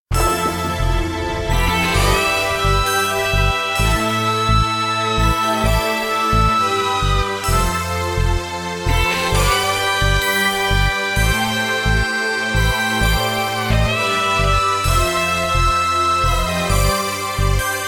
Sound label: dreamy map